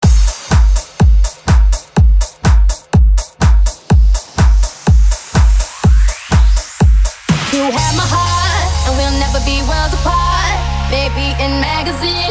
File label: future house 124 bpm